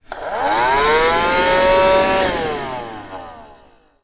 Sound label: dustbuster handheld vacuum cleaner on then off short